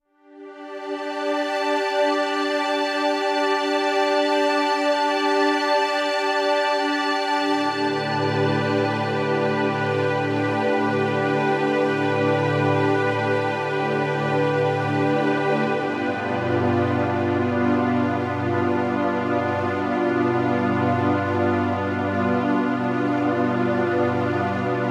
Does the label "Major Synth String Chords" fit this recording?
Yes